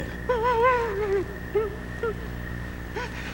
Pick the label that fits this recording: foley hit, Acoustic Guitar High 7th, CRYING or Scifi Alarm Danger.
CRYING